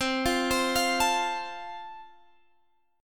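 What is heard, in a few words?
A Minor Sharp 5th, chord strum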